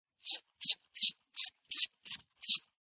Pencil Sharpener Sharpen

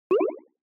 Short Accent Low

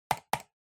PC Mouse L Hard Double

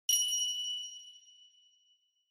Finger Cymbals (Long)

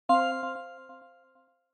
OS Sound Notification

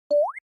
Anime Motion, Mid

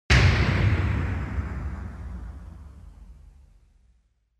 Onoma-Impact (High)

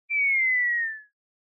Motion Falling Whistle Short

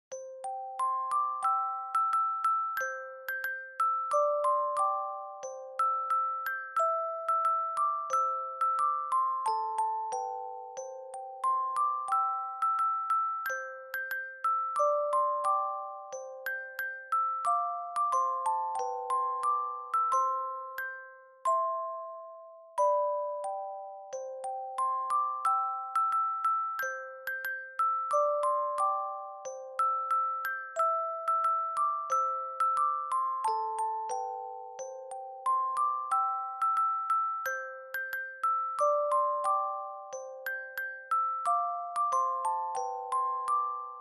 Dry Fast

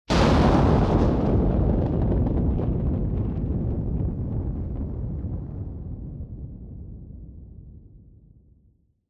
Thunder Synthetic

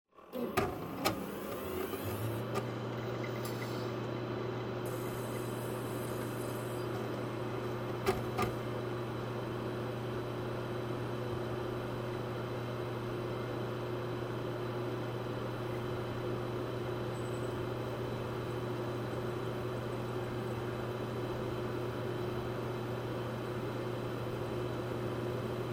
PC Operating Noise On Off

